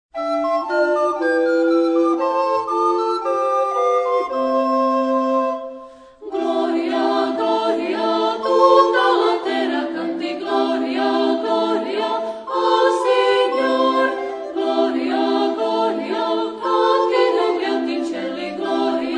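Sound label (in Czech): Komorní sbor, flétnový soubor; Bel Canto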